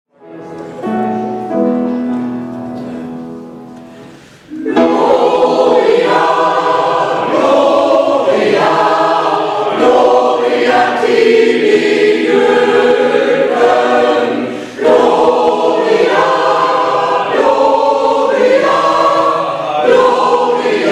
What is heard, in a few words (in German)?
Gloria Tibi gesungen von dem Senioren Chor